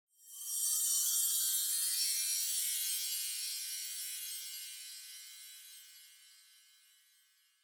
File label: Onoma Sparkle Long